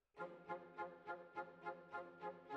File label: Flute